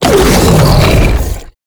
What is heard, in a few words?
Biped Windup; Warframe; ManInTheWall